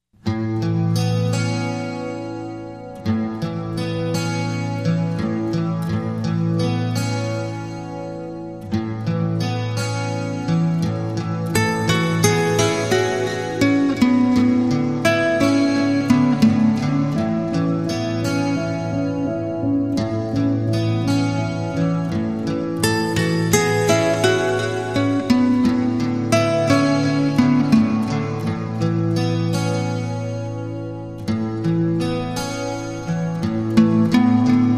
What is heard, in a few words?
Guitar Only